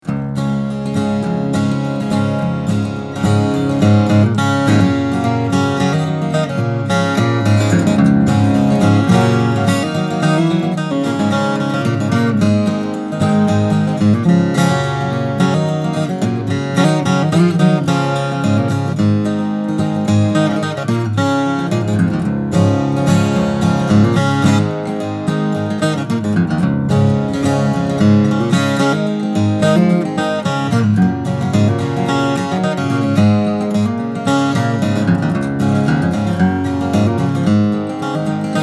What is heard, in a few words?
taylor baritone 8-string, steel string guitar flattop